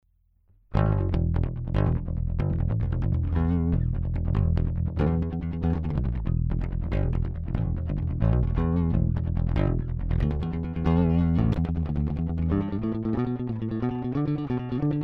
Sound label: Fender Plektrum Freestyle